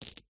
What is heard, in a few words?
foley stone gem drop onto few others; zapsplat